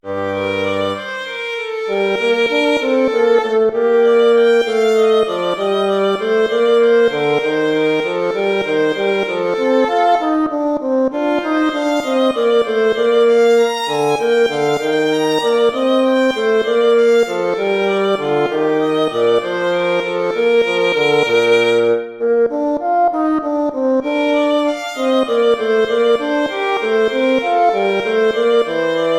gigue

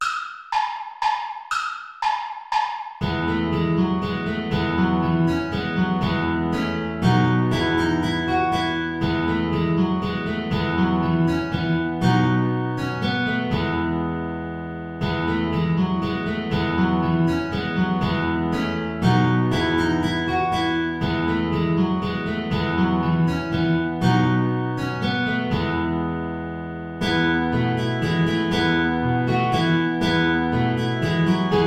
saltarello play along without guitar

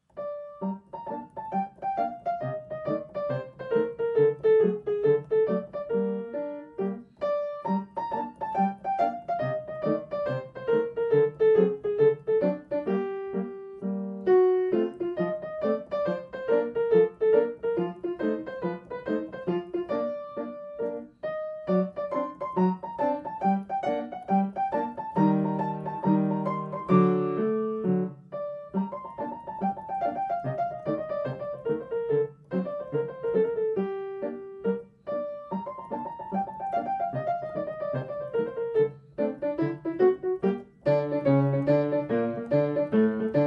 etude minor; concone giuseppe

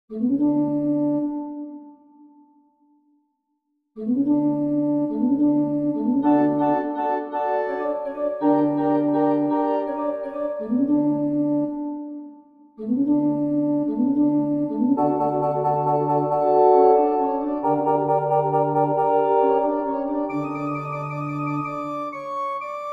chorus hebrew slaves organ duet transcription; verdi giuseppe; pensiero

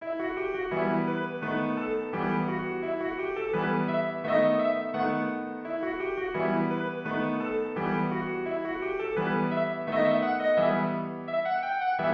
tambourin; Rameau, Jean-Philippe

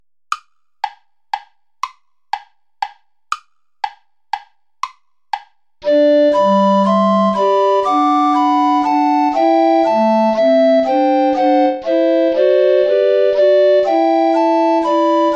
play along without tenor recorder; scholefield cla ment cotterill; the day thou gavest lord ended

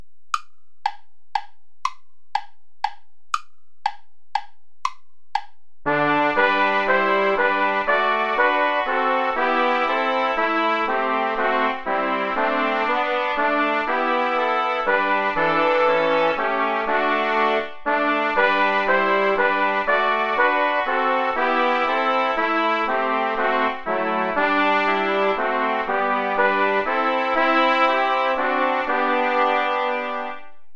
play along without trombone; scholefield cla ment cotterill; the day thou gavest lord ended

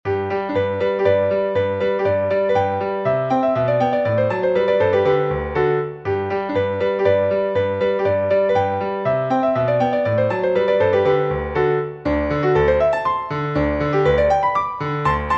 polonaise

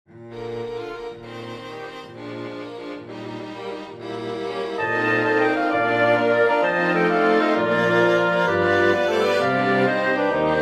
for winds strings; mendelssohn bartholdy felix; allegro con fuoco from lieder ohne worte

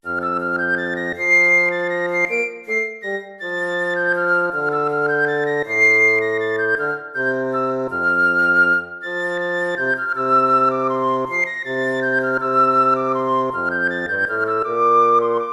piece for lute

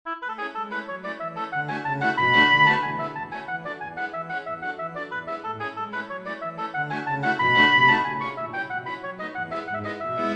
galop for oboe strings; bizet georges; bal des butantes; from jeux 039 enfants

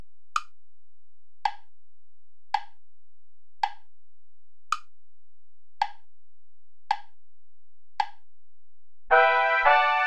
play along without trumpet; schutz heinrich aller augen warten auf dich herre

